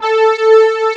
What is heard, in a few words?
DIDI PAD, SYNTHESIZER DIGI PAD; AKAI S6000 CD-ROM - Volume 1